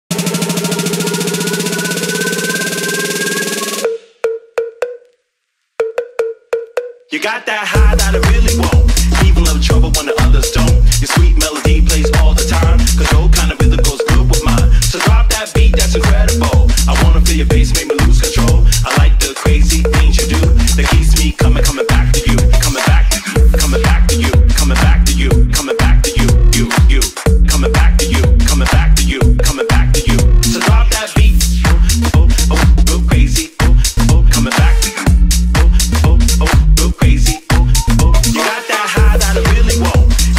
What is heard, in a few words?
shuffle Dance; آهنگ های شافل دنس دخترانه